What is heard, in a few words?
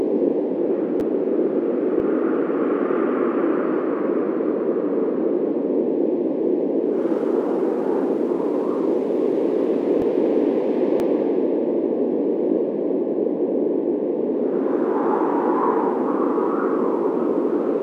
Wilderness Sounds Soundscape